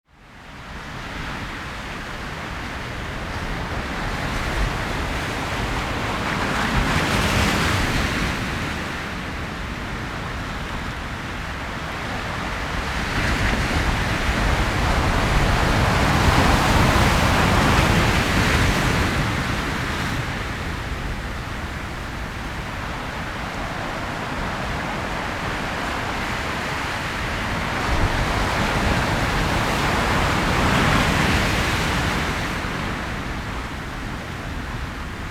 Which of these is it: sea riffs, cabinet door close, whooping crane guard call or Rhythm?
sea riffs